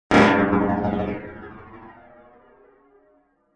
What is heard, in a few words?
Impact Hard Metallic